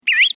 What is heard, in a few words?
Brown Thrasher